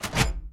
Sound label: reel Bounce; wildotron 3000